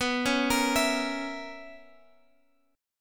B Major 7th Suspended 2nd Suspended 4th B down Guitar Open G